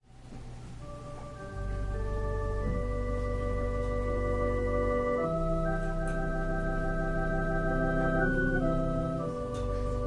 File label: Organ and violin prelude